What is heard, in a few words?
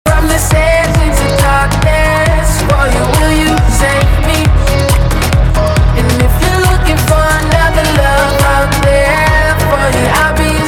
english wave music mobile ringtone song